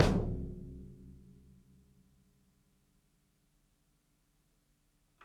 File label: ROOMY KICK, drums; BASEMENT DRUMS VOL 2